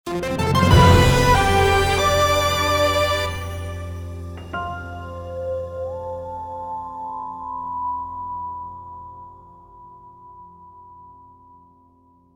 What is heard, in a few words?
Jingle Logo; Gears for Breakfast